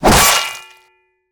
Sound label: Decapitation Shovel; Blastwave FX